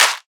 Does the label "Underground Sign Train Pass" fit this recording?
No